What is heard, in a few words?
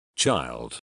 child